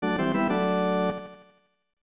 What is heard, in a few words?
Level Up; Wizardry 6; SNES